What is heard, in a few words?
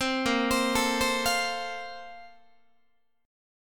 A sharp Major 7th Suspended 2nd, Guitar; A#M7sus2; arpeggiated; JGuitar